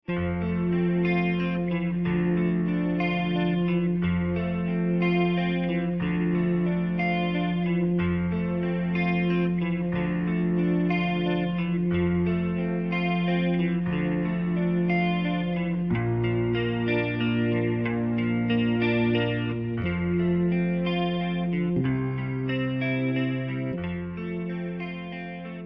(F to G) no backing vocals

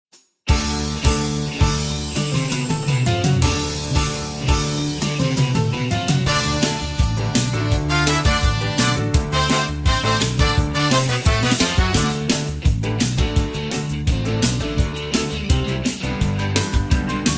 (D) No Backing Vocals

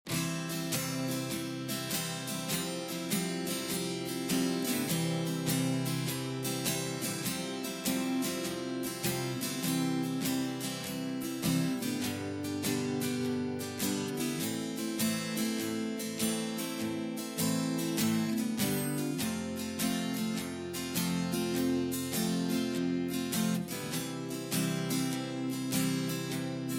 C To D No Backing Vocals

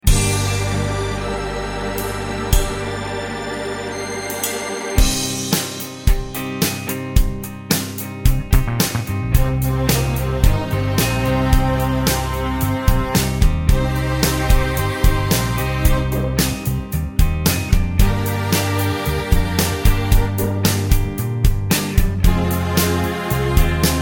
Cm No Backing Vocals

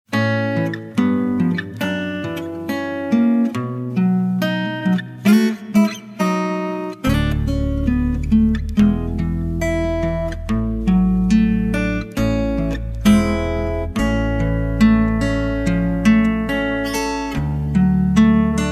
No Backing Vocals

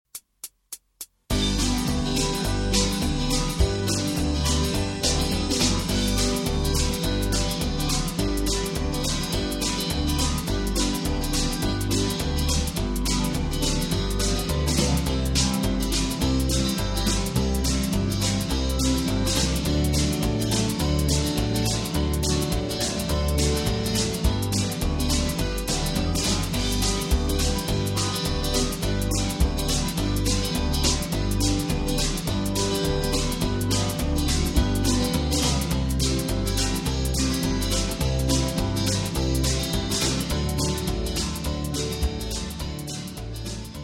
G No Lead Guitar

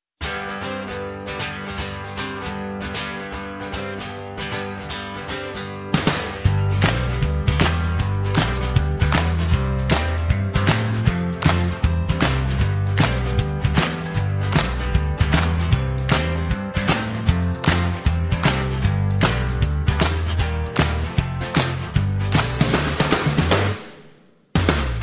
(G) No Backing Vocals

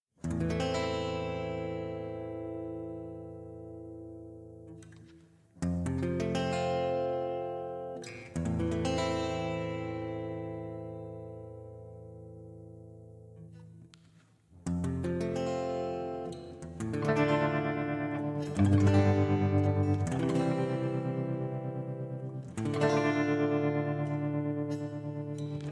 (C) No Backing Vocals

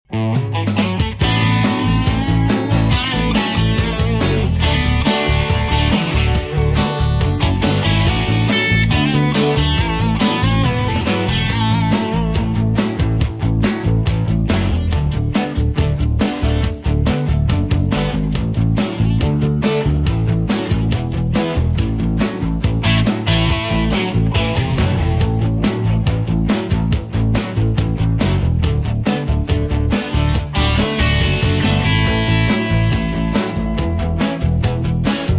A No Backing Vocals

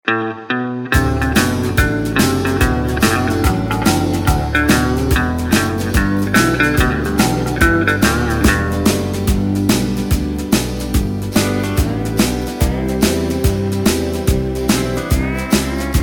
(G) No Backing Vocals

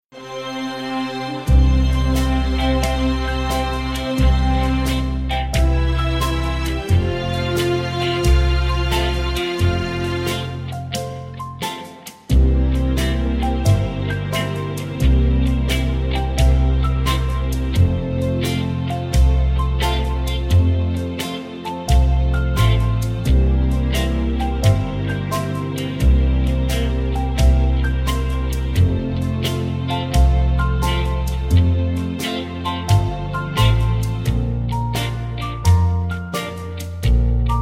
(F) No Backing Vocals

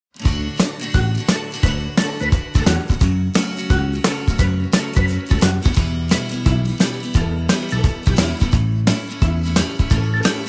(D) No Backing Vocals

